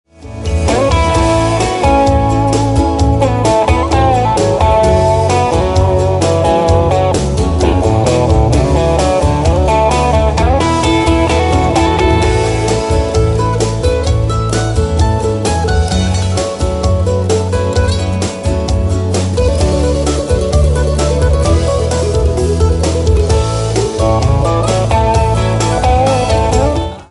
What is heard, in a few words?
Sunday (guitar & mando) ringtone